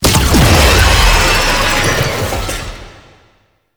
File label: Boss Clone Spawn; Warframe; Oraxia; Abilities